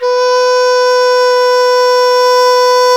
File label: QU DI B4, QI-DI FLUTE; Spectrasonics; Heart of Asia